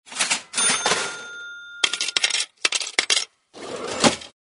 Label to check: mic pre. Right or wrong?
Wrong